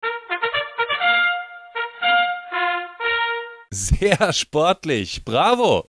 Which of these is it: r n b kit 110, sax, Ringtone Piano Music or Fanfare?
Fanfare